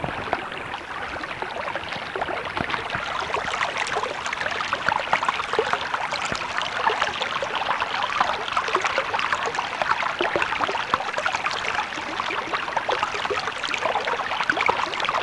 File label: streamy water